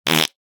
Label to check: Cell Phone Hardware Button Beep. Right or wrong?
Wrong